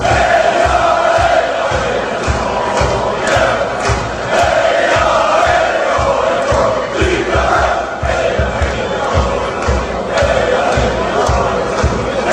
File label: drink beer, chants